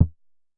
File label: Drum X Conga E, Pearl